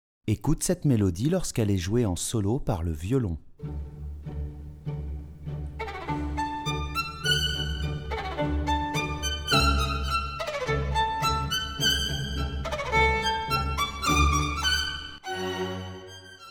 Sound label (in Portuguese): Melodia a solo vs em tutti